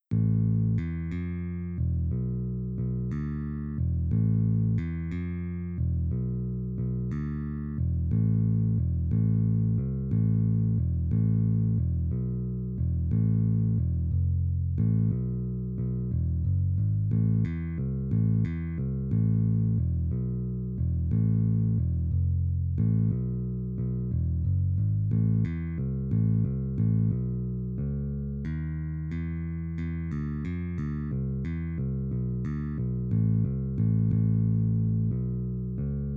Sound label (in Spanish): bajo; pajarillo verde